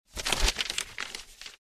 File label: Wax paper handle movement